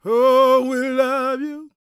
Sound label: gospel male, vocal; ILIO; Vocal Planet